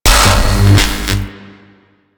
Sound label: Void Dragon Ring Sparks; Enemies; Warframe; Duviri